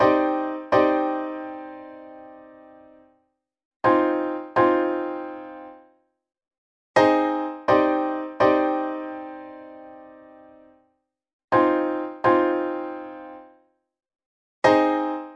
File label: 125 moody thin piano chords; KEYS ORGAN PIANO LOOPS